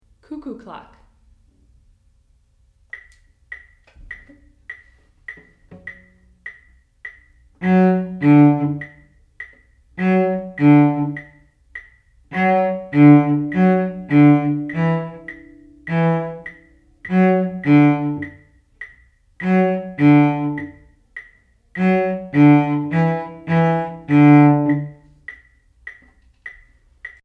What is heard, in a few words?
Cuckoo, cello; 1st position; Cuckoo Clock